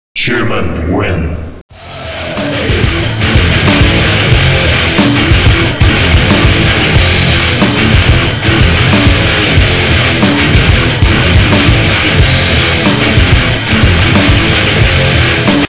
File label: human win; cstrike